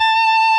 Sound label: solo Left-hand vibrato